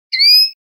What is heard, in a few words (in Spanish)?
queja coin, pardillo